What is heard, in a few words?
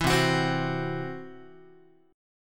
C Major Flat 5th Guitar; strummed